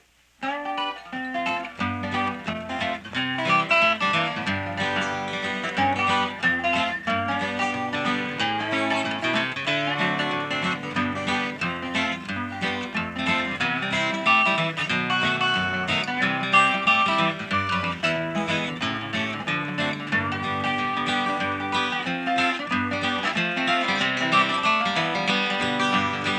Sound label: Instrumental; Bluegrass